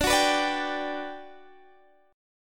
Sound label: G Major 7th D